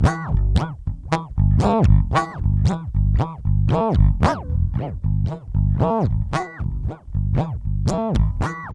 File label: BASS, Loops; East West